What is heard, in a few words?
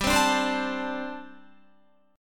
G Suspended 2nd Suspended 4th, Guitar; Gsus2sus4; strummed